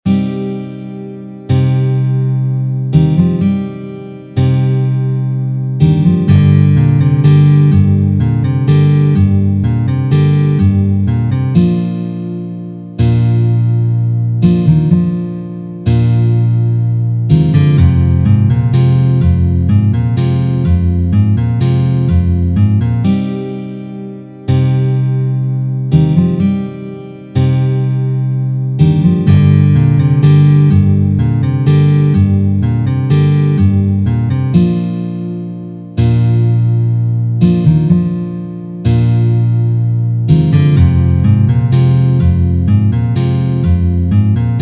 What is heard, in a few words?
Chorus Slow Tempo